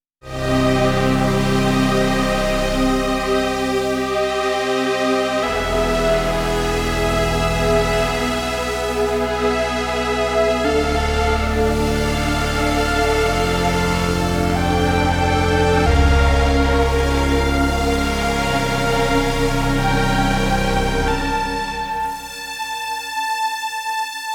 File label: string machine patch; syntakt workshop oxygene